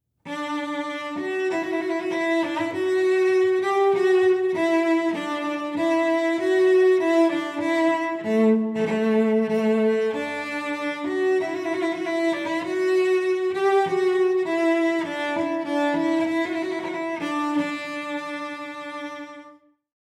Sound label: Cello; Wedding Ceremony; Ceremony Musicians